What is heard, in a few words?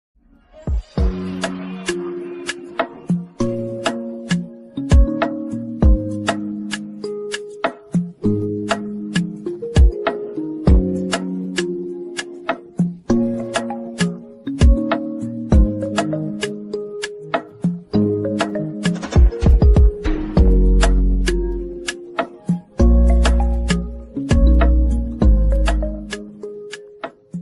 instrumentals version ringtone bgm music